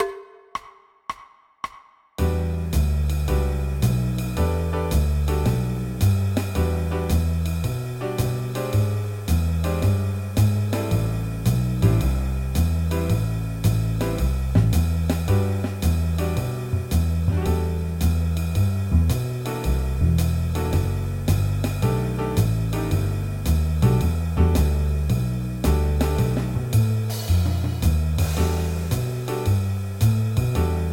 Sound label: Minor blues forma